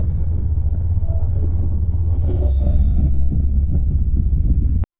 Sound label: ambient tv sounds; media